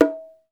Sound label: BONGO, Roland; Club-50 - Foundations Roland; Hybrid Kits; Thin 2